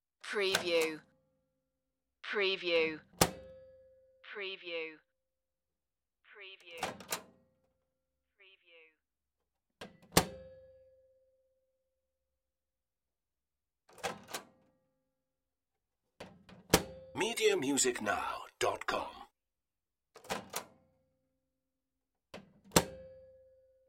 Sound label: microwave open close